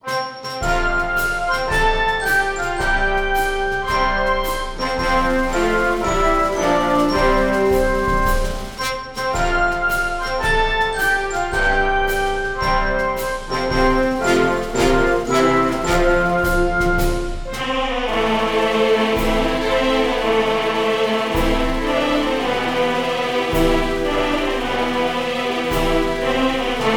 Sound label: Anthem; Anthem of Elektropia; Teslagrad